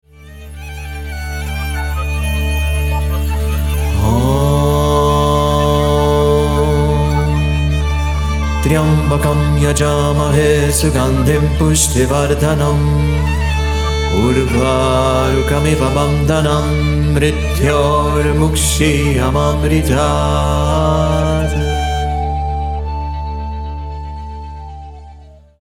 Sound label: Mahamrityunjaya Mantra